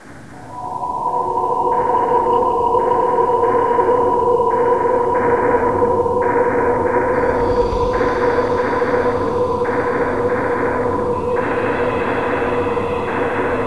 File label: forest; sven; aomdc